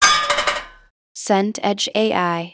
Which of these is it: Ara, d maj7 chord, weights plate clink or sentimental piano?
weights plate clink